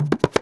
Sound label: INDIAN DR C7; Spectrasonics; Heart of Asia; INDIAN SET